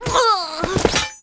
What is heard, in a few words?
Knight F dead